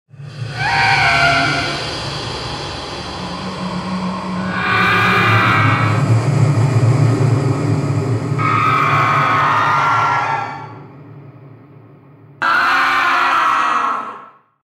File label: halloween sounds horror sound effect